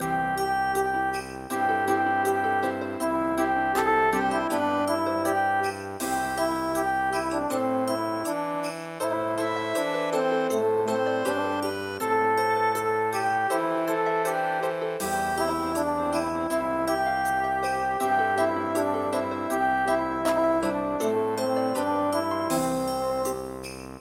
Child Song, midi; Mi bao ga

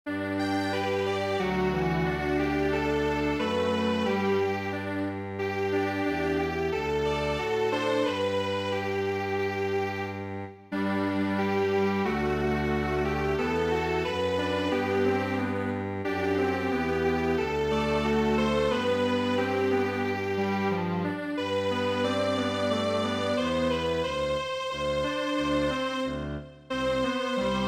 Strings Quartet, traditional; Duncan Gray

